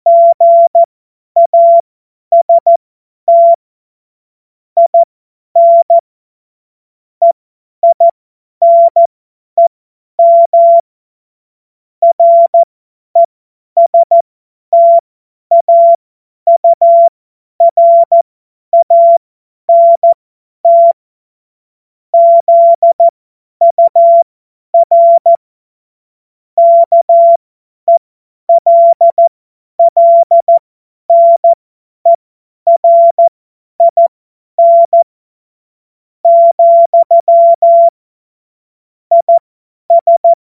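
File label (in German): Witz, cw morsen; Morsekurs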